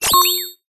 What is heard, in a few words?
science fiction computer burst beep tone